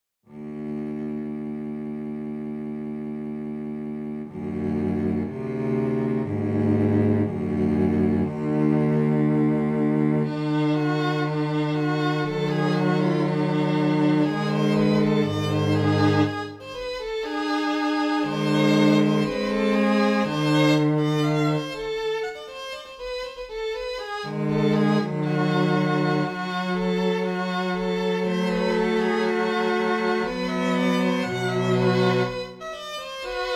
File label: strings